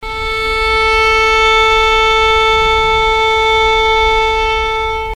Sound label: A4 violin